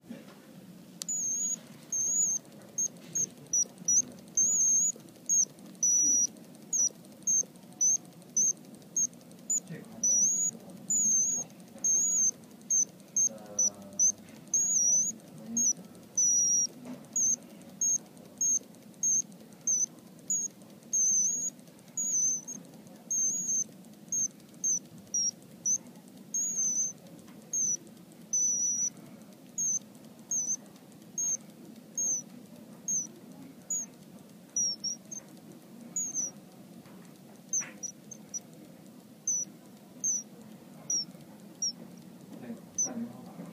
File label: Jaquet Droz Bird